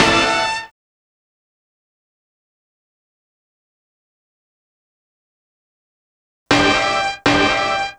Horns Stab; Sonic Foundry (Sony Creative Software); Club Joints and Street Anthems; Ruff Side

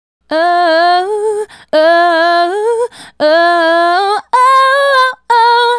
Female Solo, Vocal

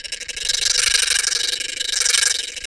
guiro, gourd guiro; Roland LCDP14 Africa VOL-2; Afro Toys

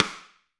RIM MIX, AMBIENCE RIM

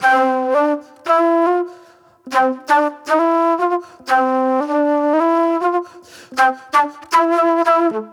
Sweet Soul Flute

